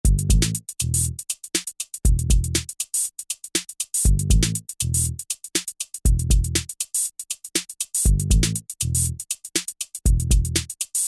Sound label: Ambient